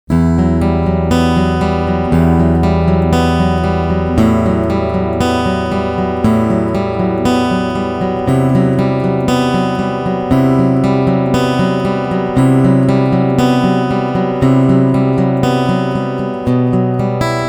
Picking CoolTube Full

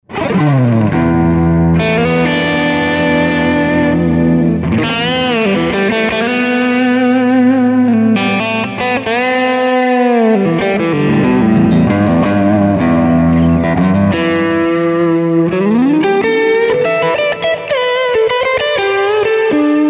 Cort G 300 Pro Crunch Lead Noodlin

